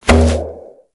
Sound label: umbrella open; Cartoon Game Sound 2.0; 카툰 게임 사운드